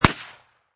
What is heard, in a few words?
Pistol Remington Gp 38 Single Shot Designed; Gun; Pistol Sound Effects